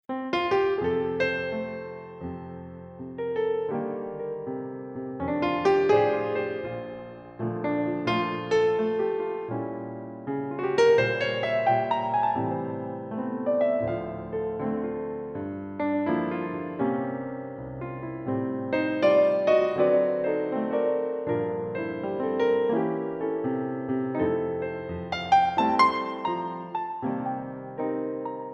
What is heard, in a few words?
Piano